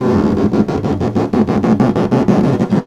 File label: Snare Drum; Percussion Samples